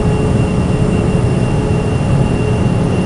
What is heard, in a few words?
A-10 Engine Idle